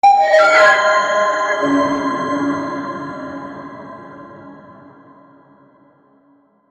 valueable game loot drop

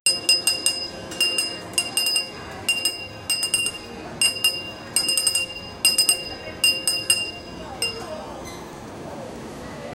background sound in a, store

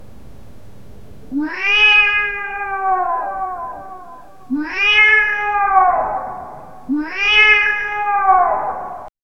cat yowl with echo

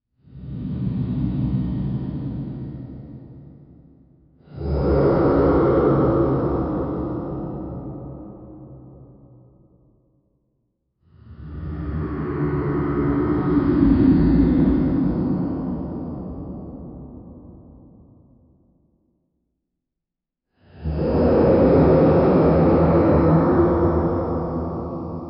dark horror horror demon

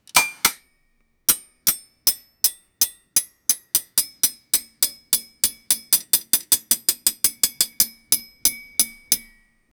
various sounds of picking, construction

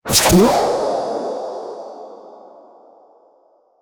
water splash comedic uh, uh-oh

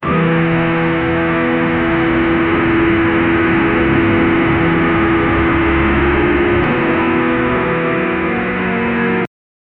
distorted radio sad music

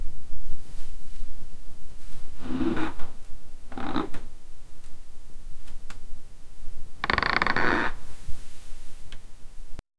subtle creak of wood

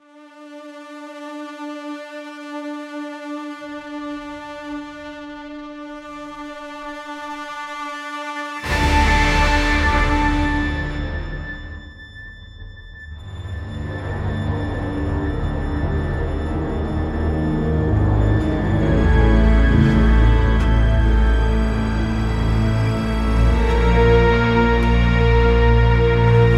deeply emotional dynamic, buildup

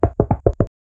rapid hollow chattering, buckles

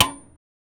one clean metallic bead, pinball

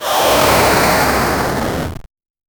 fireball sound effect, flame burst

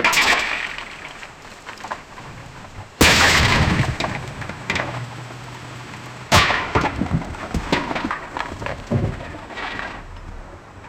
boom crash, boulder

